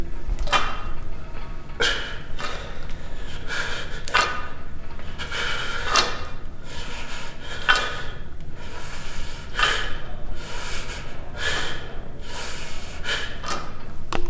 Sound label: Gym Sound